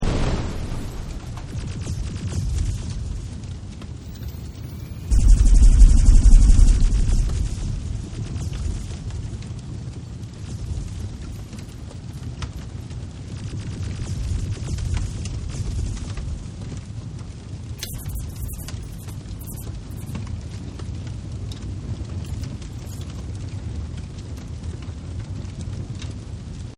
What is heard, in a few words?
ambiance; heros duty